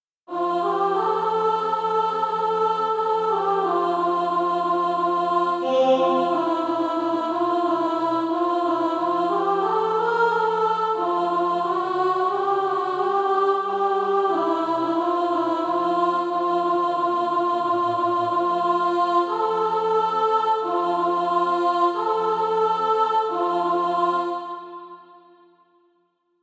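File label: alto; Eternity Gates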